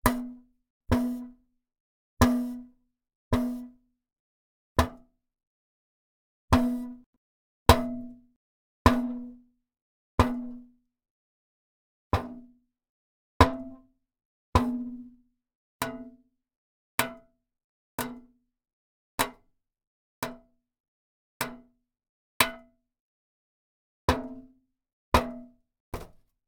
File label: hitting the pipe; human; NS Studios - ultra sound collection